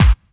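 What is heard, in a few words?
snare drum bass drum, Dance Mega Drums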